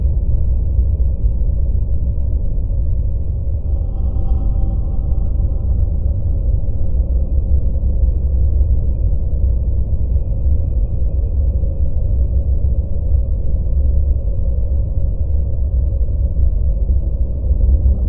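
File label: necroid ambience construction ship hum; Stellaris; necroids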